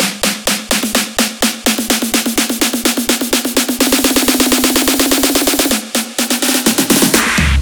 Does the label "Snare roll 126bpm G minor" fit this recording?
Yes